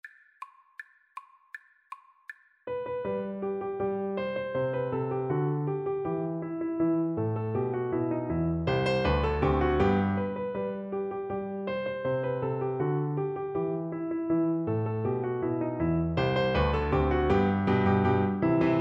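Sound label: CELLO karaoke; Mozart Musical Joke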